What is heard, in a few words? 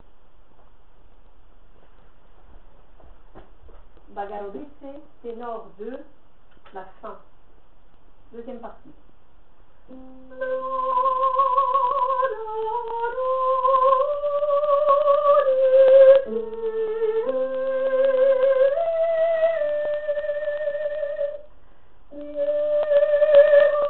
Tenor; bogoroditse